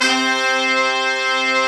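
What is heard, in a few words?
Brass Ensemble, Roland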